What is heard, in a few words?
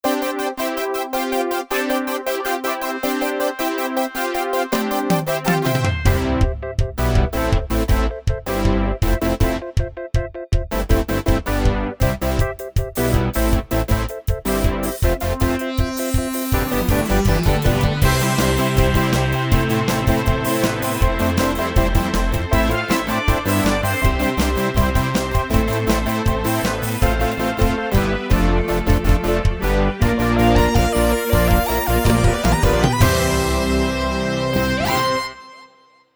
Karaoke